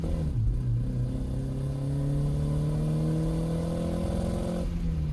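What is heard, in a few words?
engine high loop; Blind Drive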